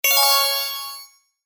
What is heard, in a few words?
break and chain